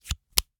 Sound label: Hwatu, card game, hard plastic, small, clatter, pull, slap, throw down, single card, strong; Eiravaein Works; Vaeyan IV